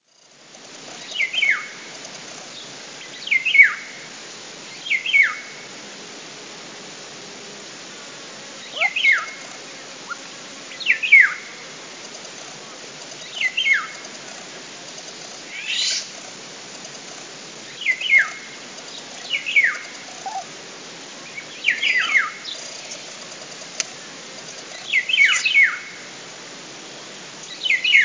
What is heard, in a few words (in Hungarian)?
madarak